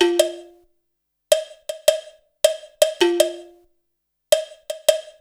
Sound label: percussion loop